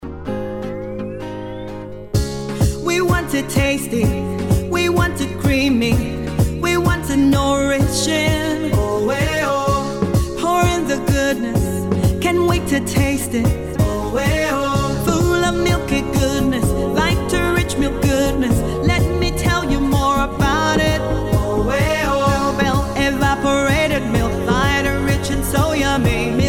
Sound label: COWBELL EVAP Jingle